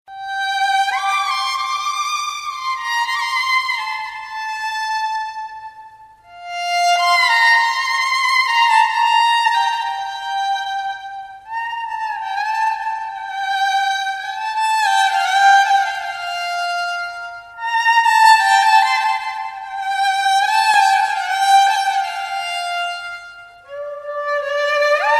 tears violin ringtone

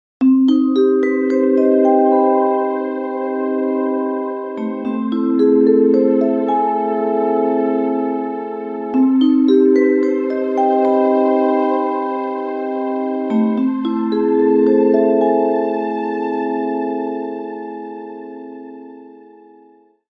dreamy melody ringtone

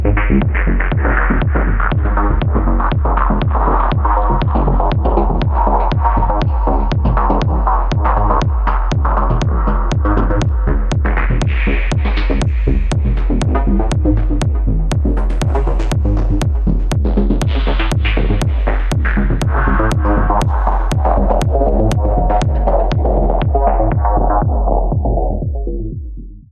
techno rhythm

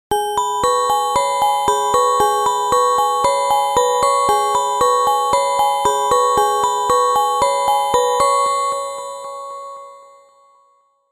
delicate bells

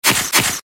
flash sms tone

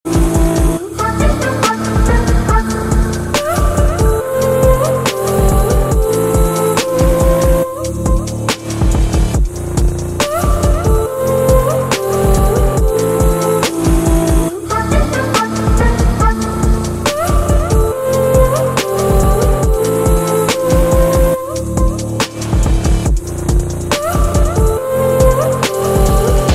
ringtone; cult of the lamb

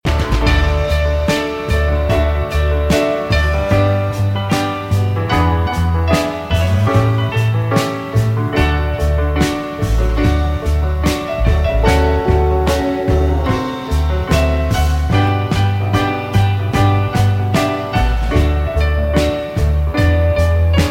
love call ringtone

